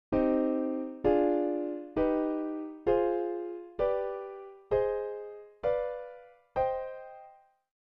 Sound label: Triads HM